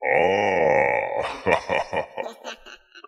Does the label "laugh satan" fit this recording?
Yes